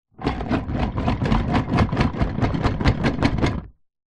foley wood small pieces in plastic sealed container shake; zapsplat